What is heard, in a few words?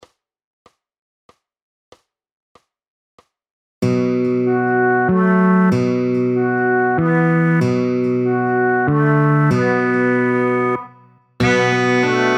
95bpm; Scarborough Fair